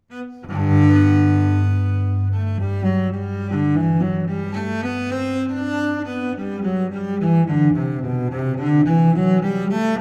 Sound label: Bach Allemande G Dur Suite, cello